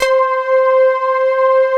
pan plucks, synths; Pro-Rec - Synthsonic

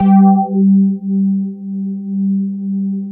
SUB HUM G4; Greytsounds; Scott Peers Sound Engineering

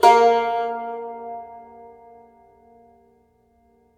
TAR A#2

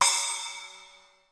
DANCE CY; 90 sSampleCDs; Crypton - DrumBase5000; DANCE KITS

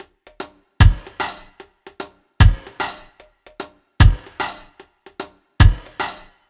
BT, Ragga & Dub Reggae

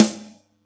Yamaha EX-5

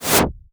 IMP WHOOSH

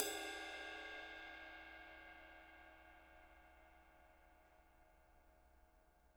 RIDE, RIDE CYMBALS; Spectrasonics - Backbeat

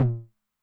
High Tune Short Decay, 909 LOW TOM; classic machines; LT - LOW TOM

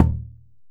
OKE-DO, JAPAN PERC; AKAI S6000 CD-ROM; WorldSounds

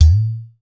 udu

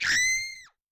Scream; Yamaha DTXpress-II

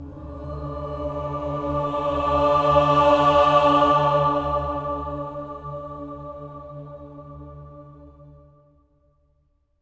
OCT A3, SWELL OCTAVE, Voices

